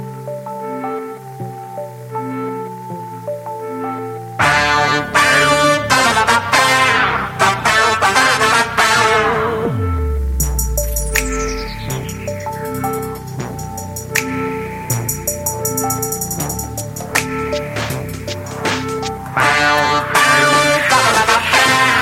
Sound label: Instrumental BGM Ringtone; The Dagaalty